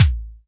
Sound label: SC40 BD, Pearl SC-40 Kicks; drum machines